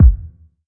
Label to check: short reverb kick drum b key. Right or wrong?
Right